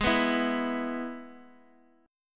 Ab Minor Sharp 5th, down

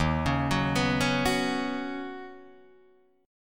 Eb Minor 7th Sharp 5th, Guitar DADGAD

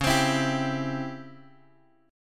C sharp Minor Major 7th Flat 5th, guitar chord; C#mM7b5 Chord {X 4 X 5 5 3} strummed

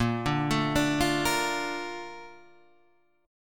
Bb Major 7th, BbM7 chord; x,x,8,7,9,9,7,7; arpeggiated

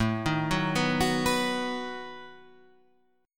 B-Major A

